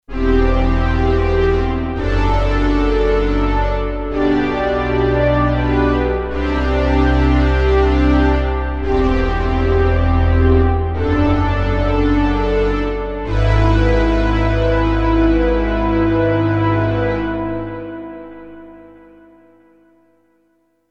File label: Soft Background, VA synth; gsi dmc 122 gemini va synth engine programming